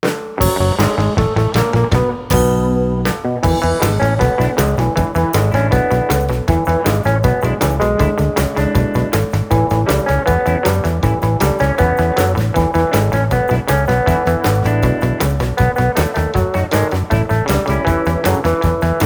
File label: Driving Fast, 50s Rock n Roll; Narrative Mind